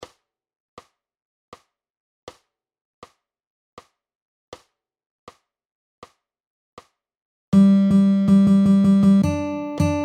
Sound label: 80 bpm, gitarre